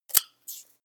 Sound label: scissors cut fast paper